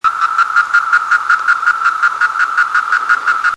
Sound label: magicicada; m sdecim CIII